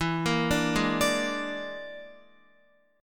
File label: E 7th Flat 5th, down, Guitar; E7b5 arpeggiated; JGuitar